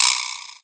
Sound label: jawbone